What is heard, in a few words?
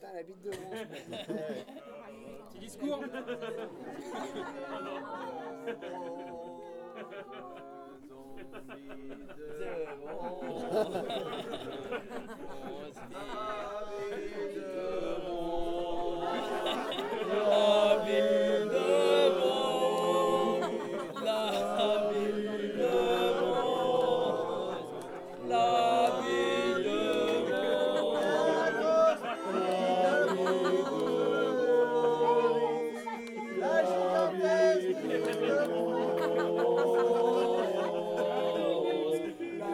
concert